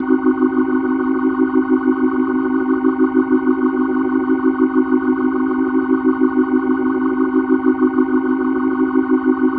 Organ C Dim; Dance; Organ Licks

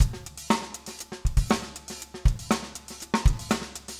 funk 120 bpm, loops

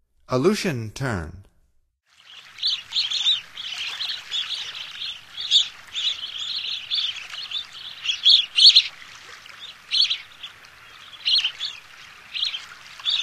Aleutian Tern; North America; Stokes

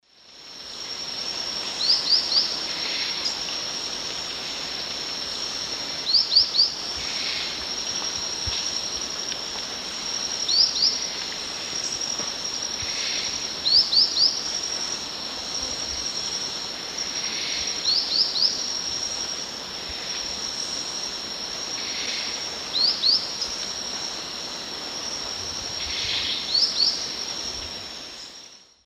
Ornithion inerme, song; S. America; Eastern Lowlands

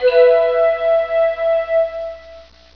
BADOO, SYNTH

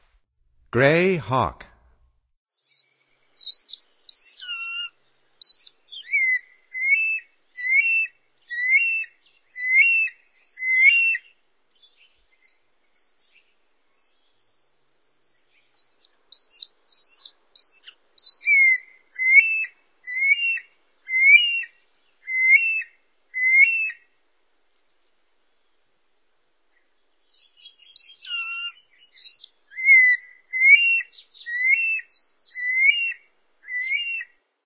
Gray Hawk; North America; Stokes

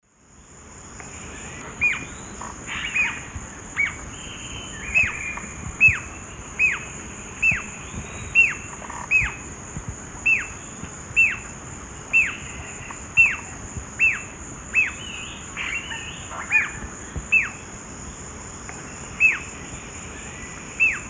Galbula albirostris, call; S. America; Eastern Lowlands

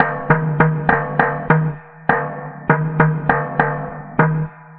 Dark Drum, Electronica, Solo Beats

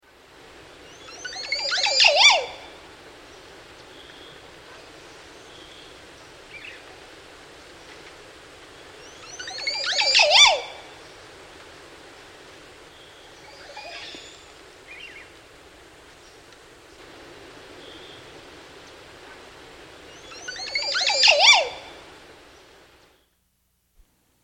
Montezuma Oropendula, S. America

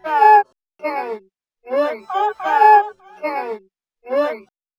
Babbling Baby, Noise Circuits; Electronica

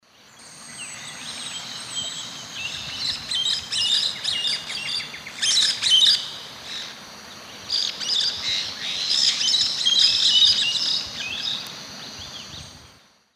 Pionites melanocephalus, group flight calls; S. America; Eastern Lowlands

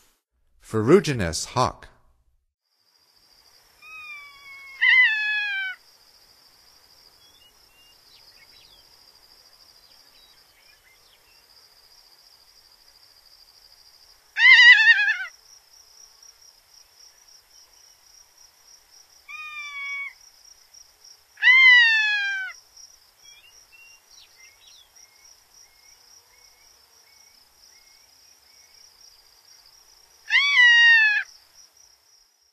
Ferruginous Hawk; North America; Stokes

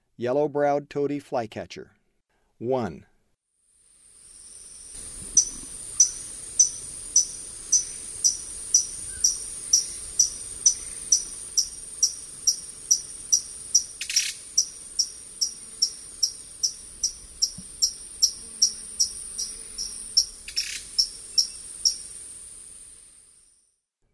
Yellow-browed Tody-Flycatcher; S. America; Eastern Lowlands

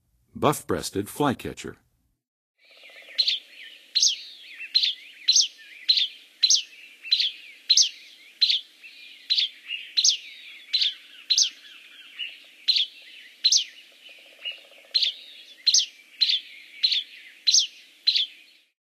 Buff-breasted Flycatcher, Songs of Birds; North America; Western USA; Western USA Songbirds

